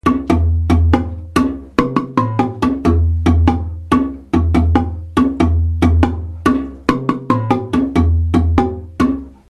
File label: bass variation, marimba; chiradza